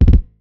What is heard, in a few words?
kick roll; notification sounds; zulip